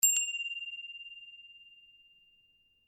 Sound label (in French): son Cloche Japon; Percu; Cloche 3; Duperrex